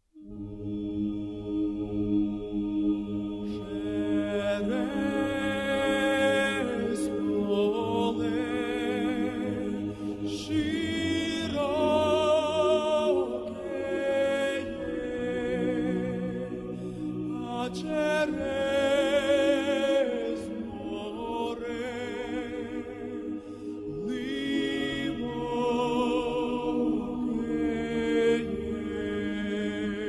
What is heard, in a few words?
16 century Ukrainian song